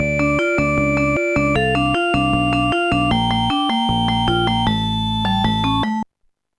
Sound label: rock ten; Instant Music